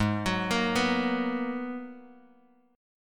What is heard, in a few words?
Ab Minor 9th down Bass Standard; arpeggiated; JGuitar